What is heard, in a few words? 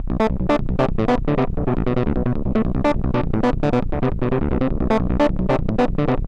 synth, Hardcore Breakbeat, 153 Bmin; Big Fish Audio; Hardcore Breakbeat Action